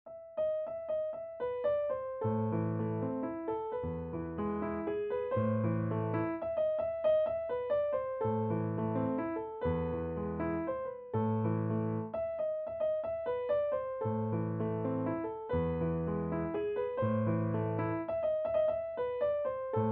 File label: piano play